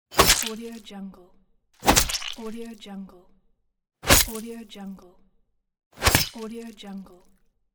knife stab; audio-therapy

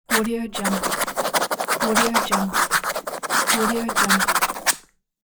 pen scrubbing

